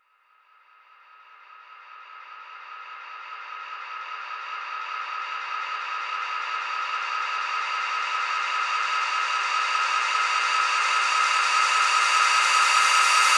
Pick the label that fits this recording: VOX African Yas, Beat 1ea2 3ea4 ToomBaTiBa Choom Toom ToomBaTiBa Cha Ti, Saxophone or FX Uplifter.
FX Uplifter